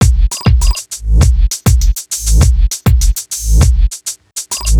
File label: Hip Hop Beat, Drums